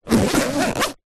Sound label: foley small pouch bag rigid fabric zipper; zapsplat